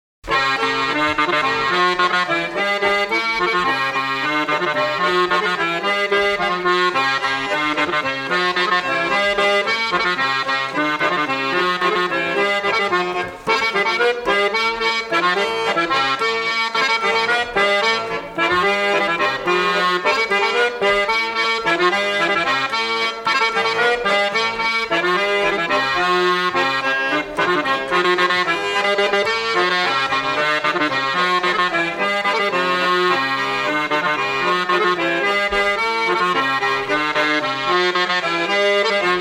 Bourrée; La Carrada

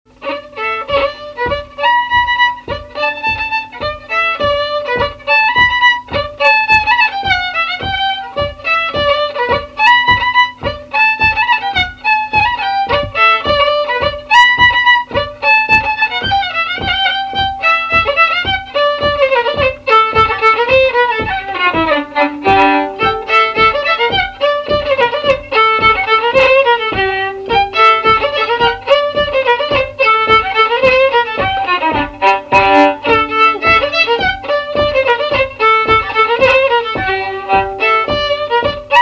Polka; Polka de Pierre l Ferblantier

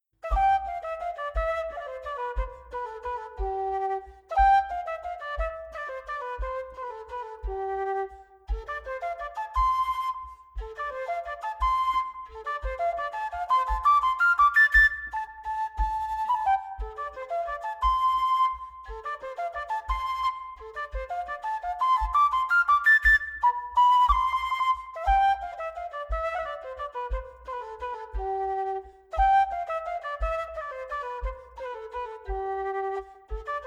bourree; Souvenir d Auvergne; Wolff

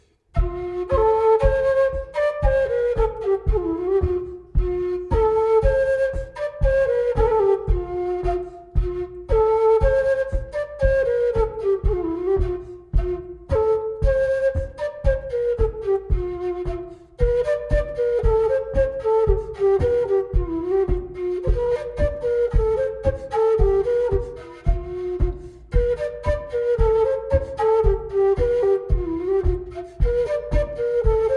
Bourrée; Recueil Lemaigre

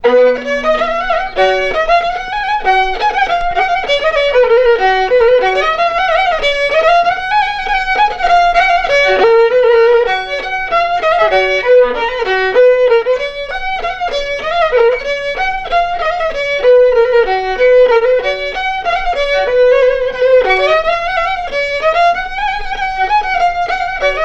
Marche; Marche de Rempart

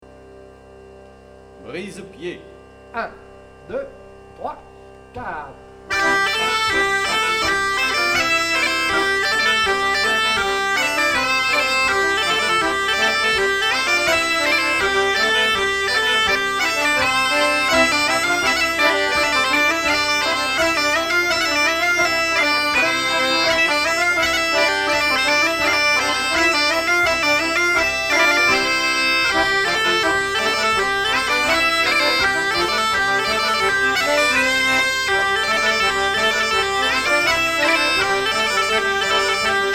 Instr Do vitesse normale; Brise Pied

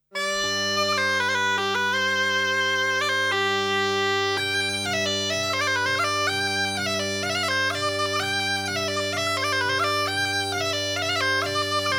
Sautière; Sautière de Martin

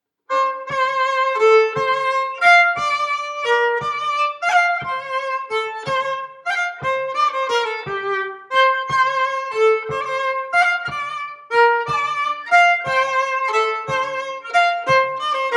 Valse

